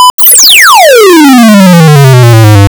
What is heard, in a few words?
Stimulus, Acoustics Test, Mic; Labview-Program